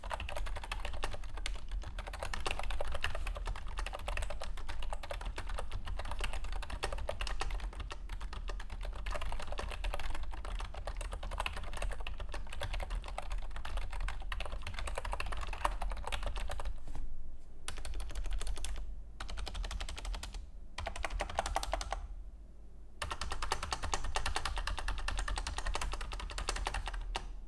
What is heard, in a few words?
Logitech Signature K650 soundtest